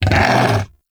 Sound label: Undazoa Vocal Death; Warframe; Hunting Critters; Characters